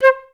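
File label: flutes staccato C4; Best Service; Peter Siedlaczek's Advanced Orchestra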